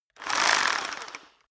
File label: rock drill hammer rev medium; maschinen; bohrer; soundpool